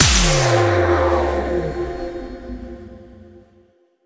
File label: Magic Orb Explode